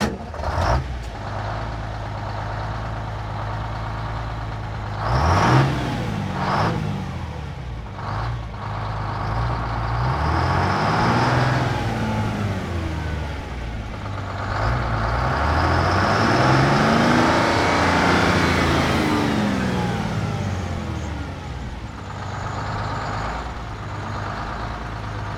1948 Diesel Truck Int Start Idle Revs Slow Forward Off; Period Vehicles